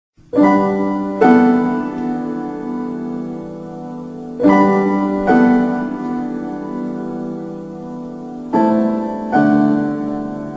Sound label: Piano nada